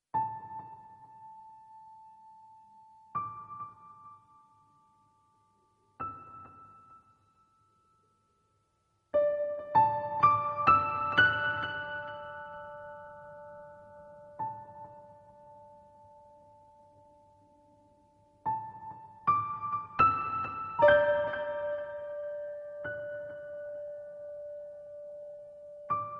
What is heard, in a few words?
piano